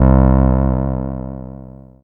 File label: Single Electric Basses, Dance Floor Bass